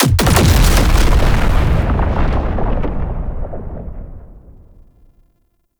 Dropship Explosion; Warframe; Tau; Prime Grineer

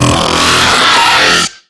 Stomp Attack; Warframe; Ambulas; Dialog Barks Battle

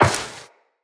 Step Metal Perforated, Footsteps, Walk; Tenno

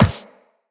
Walk Metal, Footsteps; Operator

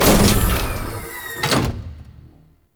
Dropship Close Door; Makvoss Cannon

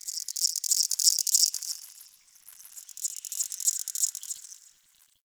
Cricket; Warframe; Tau

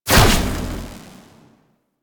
Amp Chassis Fire, Amplifiers; Operators; Corp Amp Set One

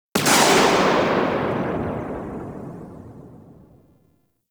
Arch Railgun Charged 2D Tail; Warframe; Archwing

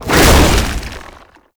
tank infested charge launch; Enemies; Abilities; Warframe; Lasrian Tank

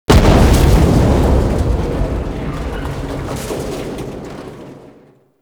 Air Bombard Explosion; Warframe; Venus

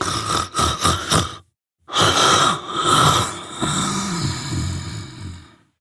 Snores, Mother, Vocalizations; Warframe; Deimos

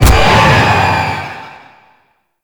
Super Death Impact; Warframe; Dante

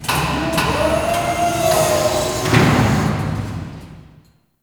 Machine Lift; Ambience; Warframe; Corpus Railjack

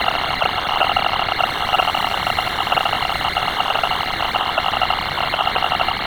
Clan Dojo Research Column; Warframe; Ambience; Dojo Misc

